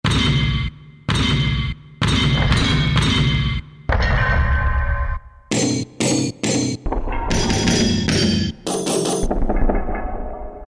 STAKE, Latin And F.X. Percussions; Roland SN-U110-02

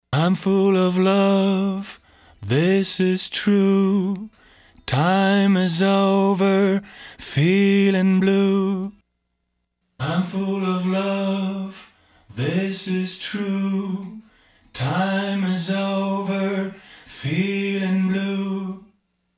Small Booth, Lexicon MPX 1; Factory Programs

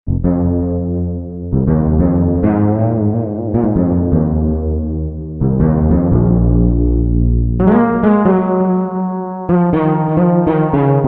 Dark Bass; Korg MSC-1S Variety

